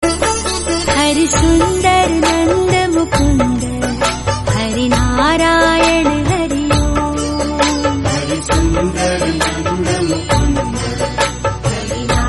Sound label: FEMALE RINGTONE